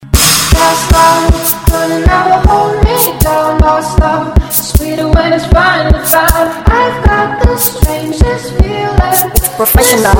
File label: Beat